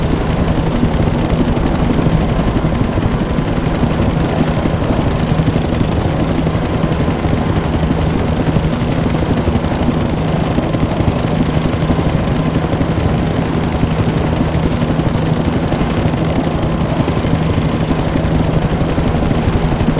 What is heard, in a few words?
Heli Loop